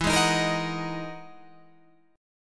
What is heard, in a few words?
E-Major Sharp 11th E, Guitar Open G